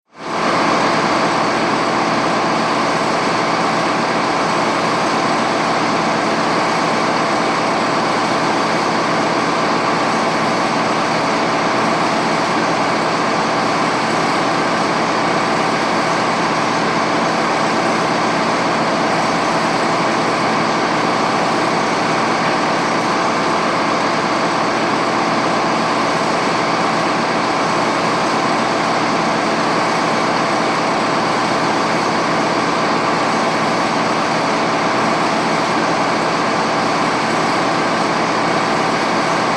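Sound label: Ferry Interior Engine Room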